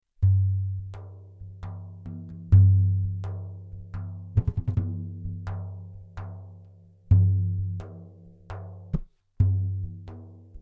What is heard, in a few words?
Frame Drum